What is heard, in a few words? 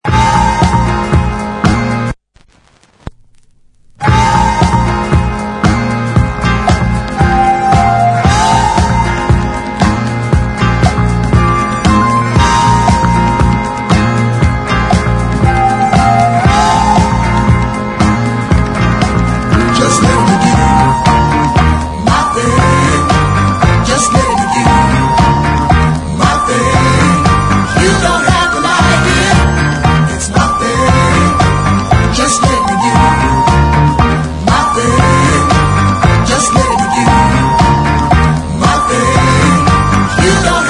sine